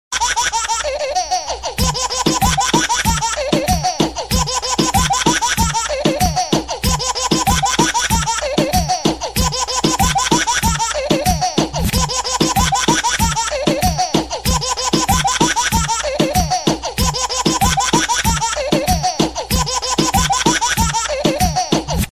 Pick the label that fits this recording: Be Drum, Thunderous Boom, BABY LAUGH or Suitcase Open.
BABY LAUGH